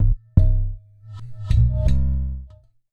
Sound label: Udu; Sonic Foundry (Sony Creative Software); Ma Ja Le Ethereal Textures